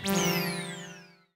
echo, magic

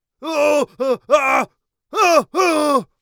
on fire, Warrior Voice; Warrior Voice Pack

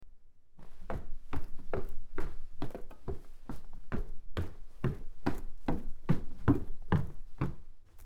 Stair Footsteps; horror sfx vol 2

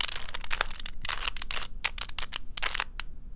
Small Cube - Twist, Rubik's Cube

